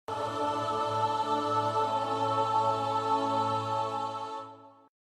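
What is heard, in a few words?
background choir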